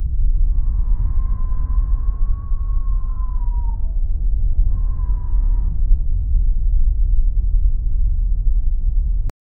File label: a vast medieval battlefie, distant shouts